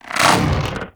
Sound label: Head Pull; Warframe; Teralyst; Ropalolyst; Flight